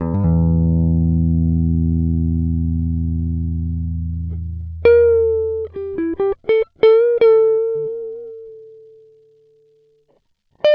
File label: solo guitar dry; sonic instruments